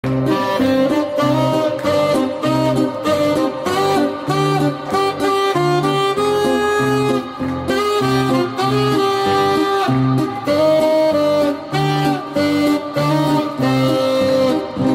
Instrumental

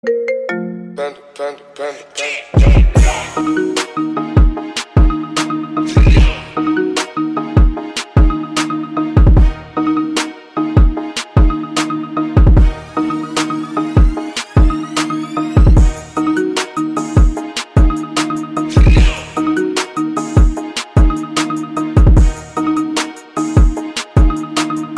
IPhone 11 Remix

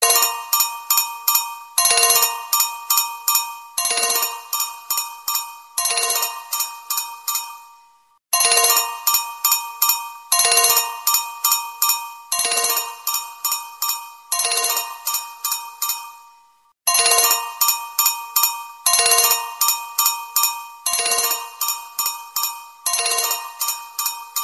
Sony Xperia X3 Tone; Sony Xperia X3 Tone Klingeltöne

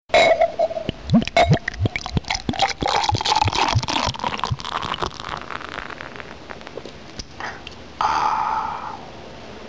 Beer Sound

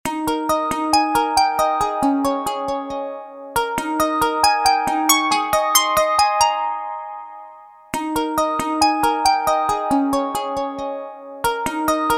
Snowflakes

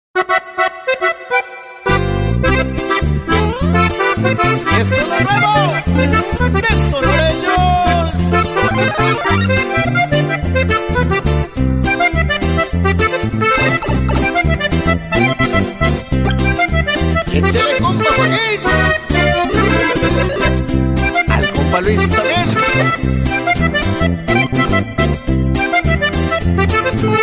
Cumbia

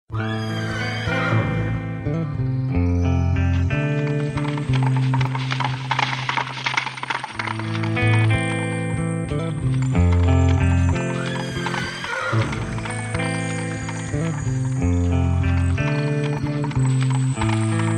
Horse Hooves

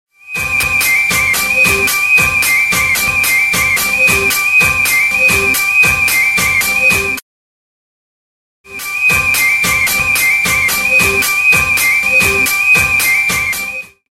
Alarm Sound Crazy

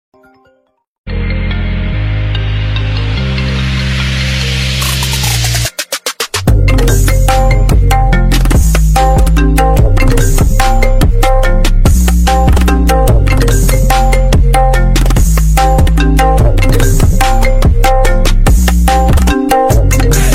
iPhone 12 Pro Max Original

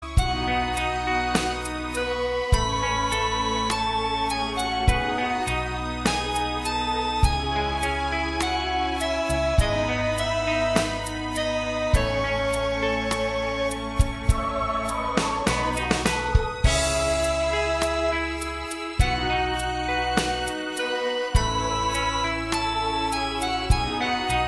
Polyphonic Ringtone

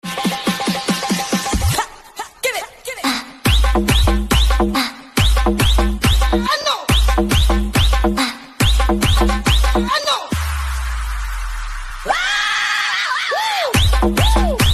Whistle Mono

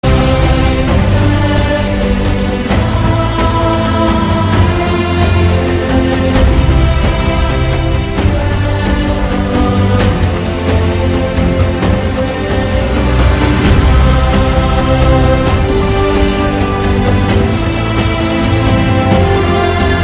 Avatar Music

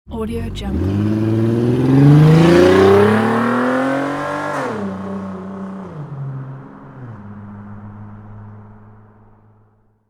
Sports Car Driving

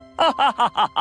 Laugh; Tsukasa Tenma; Klingelton

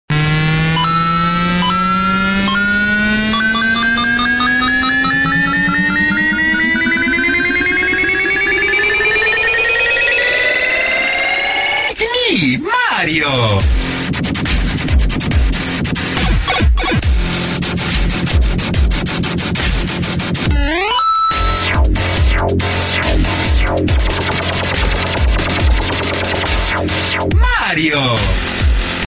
Dubstep Game; Mario; Klingeltöne

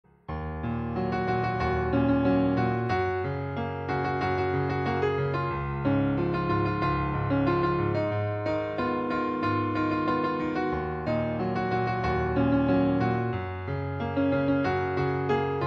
Piano Cover

